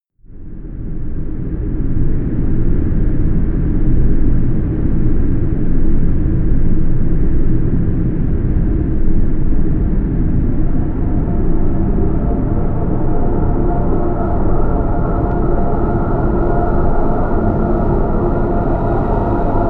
drone cool

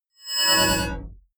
hologram appear, energie

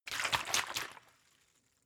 bottle water shake short; berufe

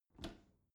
bathroom cabinet open regular; objekte; tueren

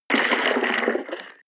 splash low; haus garten

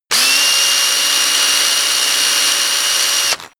drill classic long; maschinen; bohrer; soundpool

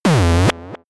synth hit; hits impacts

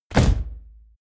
bodypercussion claps; whooshes rises; hits impacts

